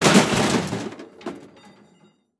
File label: Crash Aluminum Garbage Can Dropping On Pavement With Metal Slide; crashes; AdobeCC